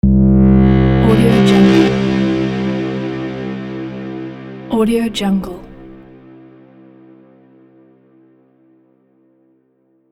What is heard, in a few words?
starter riser sweep fx; decepticon